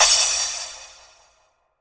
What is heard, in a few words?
Crash, Cymbals